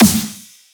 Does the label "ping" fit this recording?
No